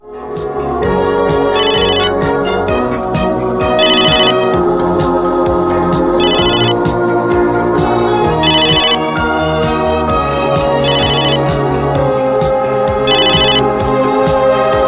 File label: lumia 3d cell phone ringtone